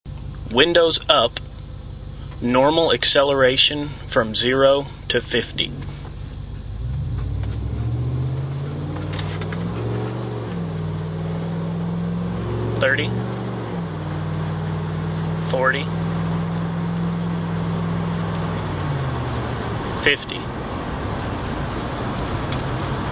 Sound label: 0-50 W-U Accel, aero-turbine muffler